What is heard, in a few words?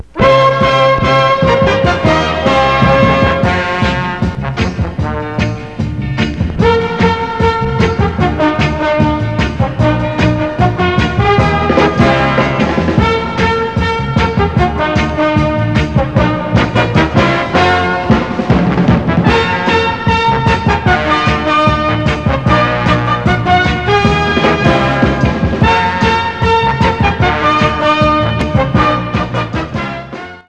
brass bon